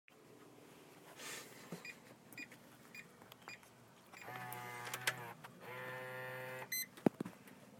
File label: my front door unlocking